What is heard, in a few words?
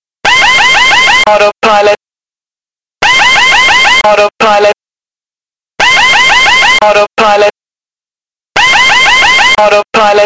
md80 autopilot disconnect